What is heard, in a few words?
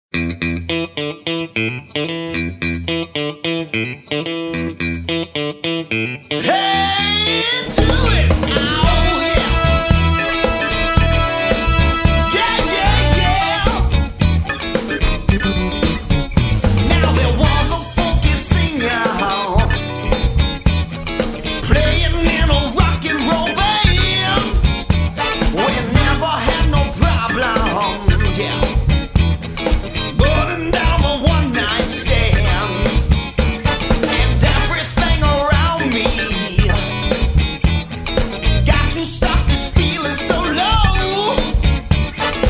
Funky Music